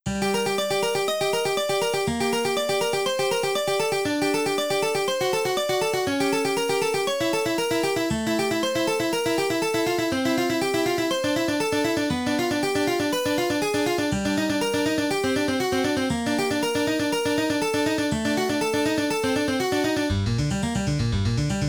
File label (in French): Etude pour les changements de claviers clavecin